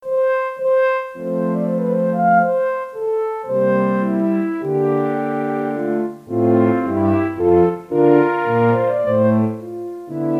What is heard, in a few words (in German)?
Einschwingvorgang Klavier